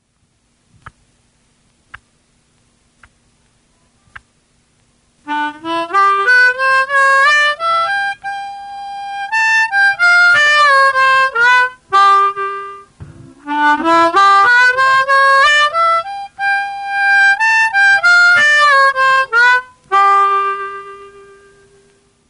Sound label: blues lick, garmoshki